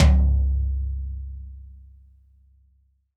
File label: TD-20 Tom B, Roland TD-20 Toms